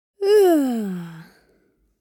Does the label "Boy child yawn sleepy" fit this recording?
Yes